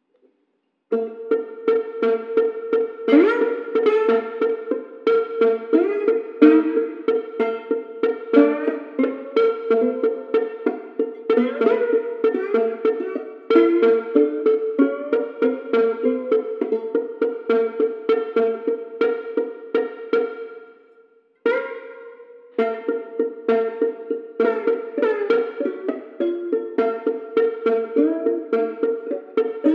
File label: Grain Arpeggio